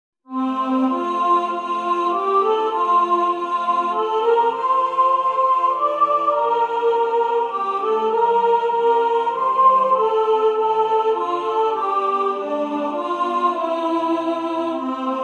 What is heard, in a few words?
Soprano; Away In A Manger